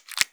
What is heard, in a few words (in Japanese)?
くちゅ音単発